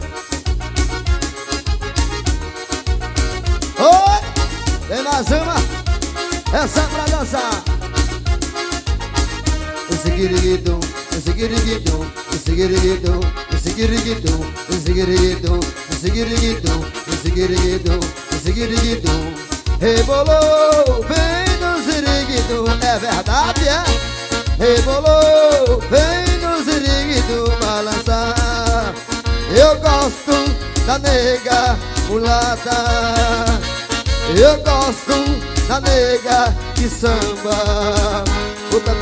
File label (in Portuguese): acordeon